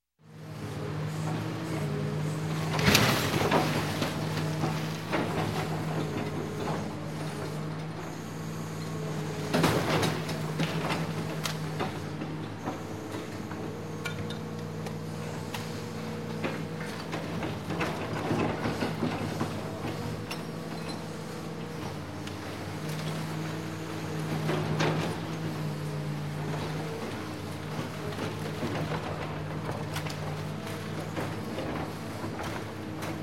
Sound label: Construction, Demolition Bulldozer Wrecking; Sound Ideas 2000 Series